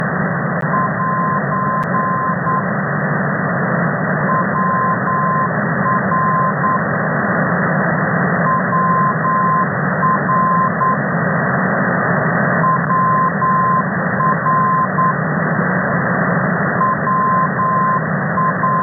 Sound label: hf ndb